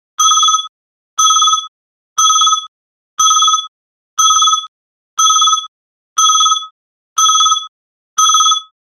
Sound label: mobile ringing call; phone